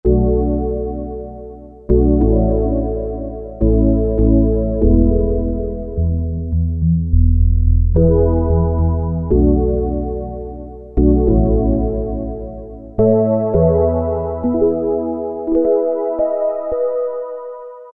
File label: Dark Synth Piano, Roland Juno-106; Factory Preset